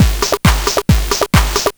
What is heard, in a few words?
Game Boy Kick Loop, 8-Bit, Drums